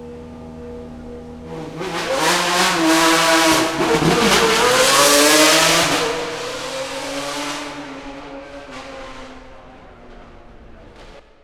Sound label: Pagani Zonda R Sound